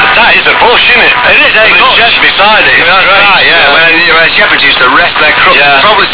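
local fm, Scan samples of different Transmitter types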